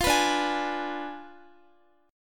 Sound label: D Diminished 7th D, Guitar; Ddim7 strummed; x,x,15,16,14,15